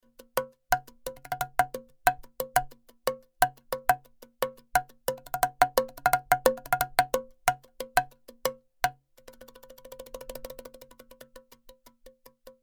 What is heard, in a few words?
Bongos